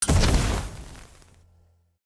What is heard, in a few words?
thruster start; vanquish